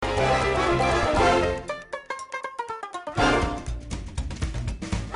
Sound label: Game Tune